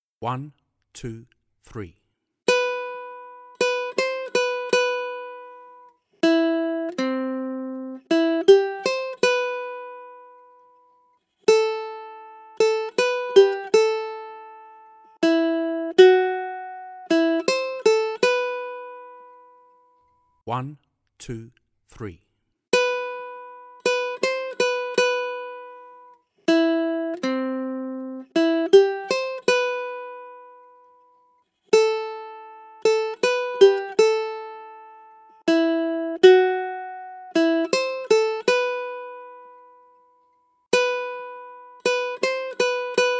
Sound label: Accompaniment, ukulele; grade3